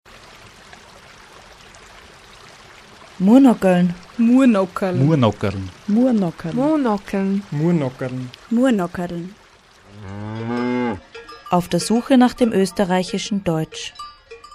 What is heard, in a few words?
Jingle